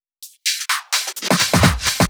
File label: Fill 128 BPM; Vengeance Samples; VEH1 Loops